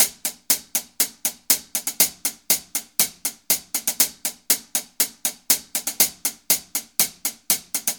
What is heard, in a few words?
hihat, reggae loops